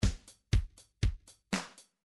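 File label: midi drums heavy rock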